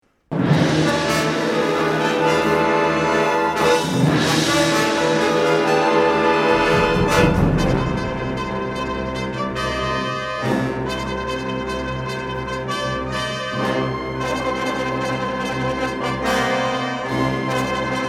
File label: Fanfare; Gavorkna